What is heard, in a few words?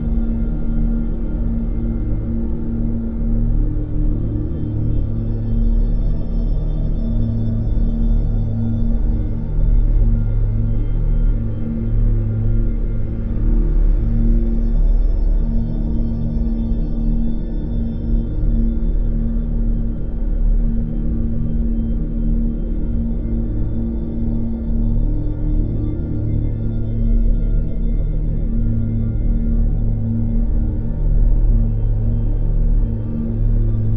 ship small starbase idle; synthqueen; Stellaris; machineage